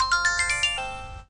Cash Charge; MilkChoco

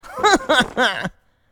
Character Cheer; Porky

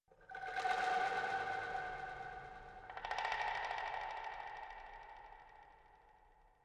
Woods Front

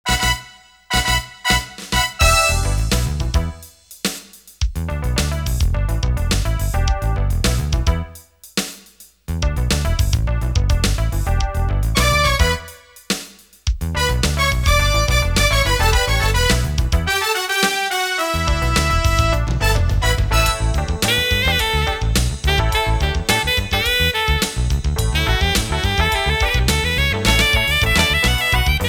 Funk; Sierra Soundtrack Collection

